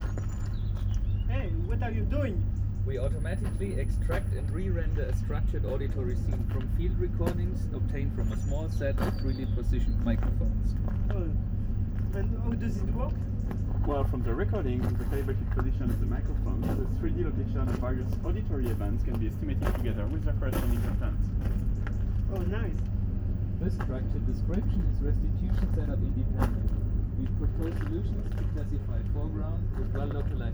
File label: ambi Outside